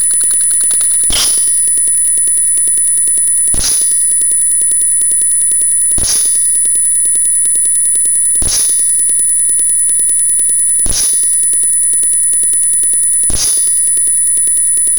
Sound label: feedback